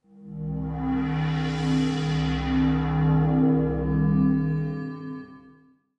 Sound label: SPHERE, D+B, 160, C; eJay